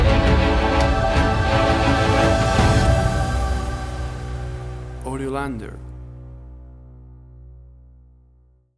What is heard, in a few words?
Big Finale Choir Strings Stinger